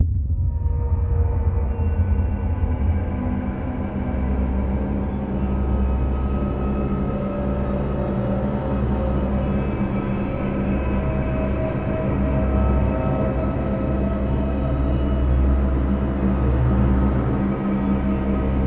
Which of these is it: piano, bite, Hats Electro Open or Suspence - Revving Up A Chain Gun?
Suspence - Revving Up A Chain Gun